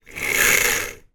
blind fabric raise; household; zapsplat

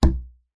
foley rubber band large thick ping thud; zapsplat

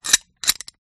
Metal Barrel Bolt Lock Sliding; Sound Spark

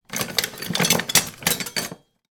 household kitchen utensils rummage; sound effects one

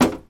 foley footstep single metal ramp

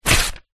paper sheets x2 rip tear; foley

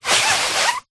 human blow nose into tissue paper short

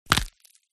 DESIGNED BONE BREAKS; PMSFX

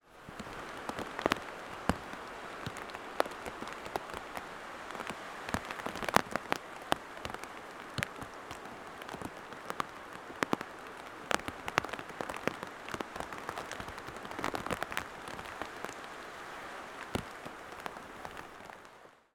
rain drips on raincoat hood recorded inside hood

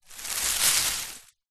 tree branch drag ground leaves; zapsplat

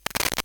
glitched tones AM Radio Interference

